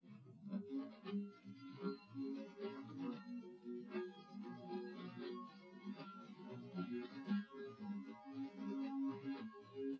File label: nodrums inst sim